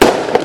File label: firework